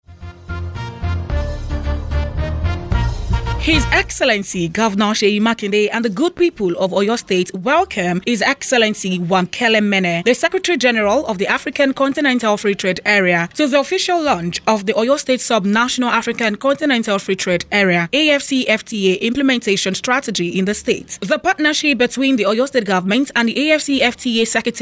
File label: audio jingle; OYSG AfCFTA